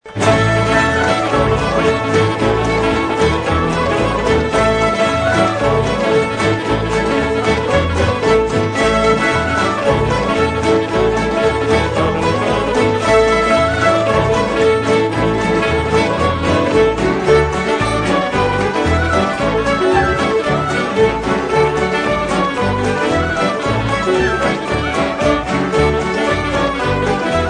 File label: Telephone Tune